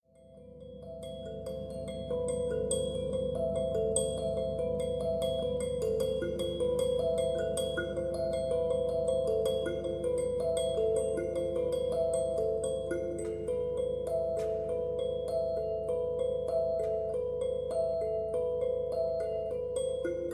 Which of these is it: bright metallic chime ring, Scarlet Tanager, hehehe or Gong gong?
Gong gong